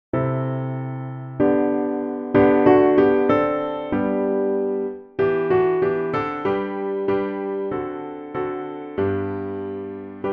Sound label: piano playing the soprano parts